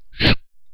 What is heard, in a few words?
shoot